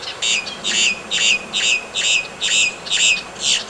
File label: red winged blackbird; BIRDS